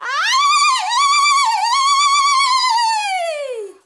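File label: FEMALE RAVE; Spectrasonics; Heart of Africa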